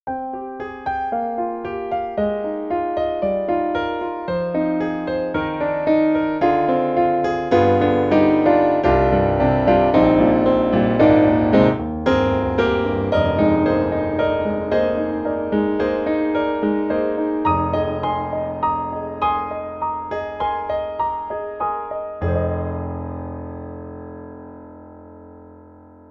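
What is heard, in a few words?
Grand Piano; Roland; SRX-11; XV-5050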